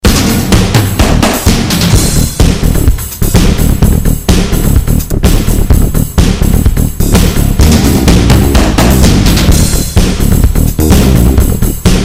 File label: Rock